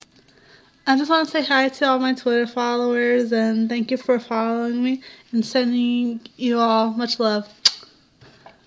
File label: muah